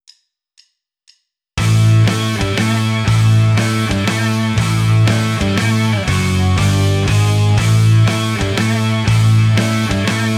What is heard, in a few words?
Rockschool, Guitar; Guitar Techniques 2018 Issue 289 December